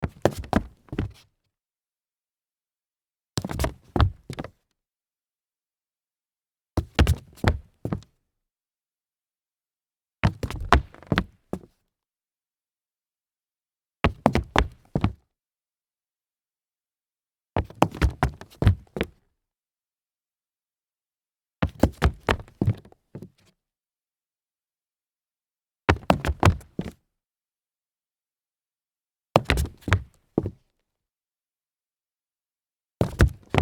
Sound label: dress shoe stumble concrete